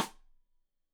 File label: Side Stick Stereo; Deeply Sampled Drums; SMDrum